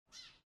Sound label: goose